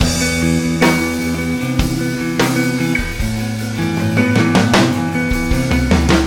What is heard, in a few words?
loop guitar